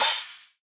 crash, cymbals, drum one shots

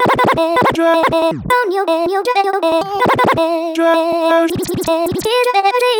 Vocal Chop Loop E 160; Vocal Loops; Ghosthack Advent Calendar 2018 Day 9

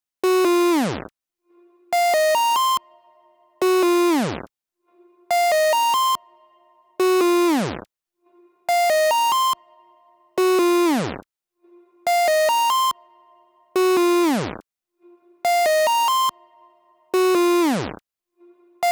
142 BPM F Min Lead, loop stems; Melody; Cymatics; 2022 Melody Collection; Wavy; Filthy

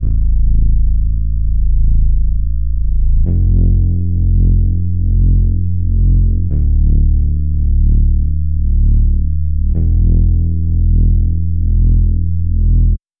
148 BPM A Min Bass; Cymatics - 2022 Melody USB Expansion; Blue Pt 1

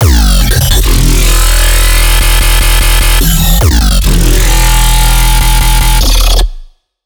150 Encrypted, Dubstep Bass Loops

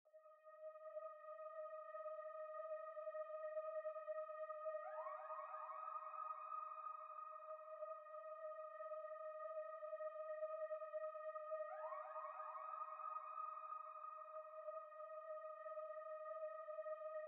140 BPM D# Min Drone, UK Garage; Melodies; Loop Stems; Cymatics; GHOST (Beta Pack); Speaker

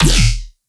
Riddim Bass Shot D#; Dubstep; Riddim Basslines; Angler; Ghosthack; Advent Calendar 2019 Day 17; Riddim Bass Shots

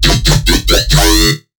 Riddim Bass Hit F#

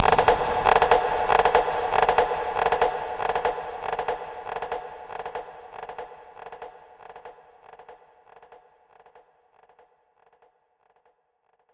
Conga Swirl 142 BPM; Psytrance; Ethno Box

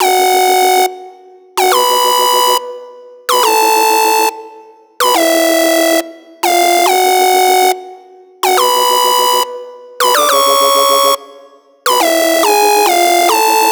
Riddim Synth Loop F# Circuit (140); Ghosthack; Riddim Essentials 2020

